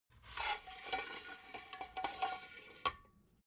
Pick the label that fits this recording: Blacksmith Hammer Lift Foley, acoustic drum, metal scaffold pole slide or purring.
metal scaffold pole slide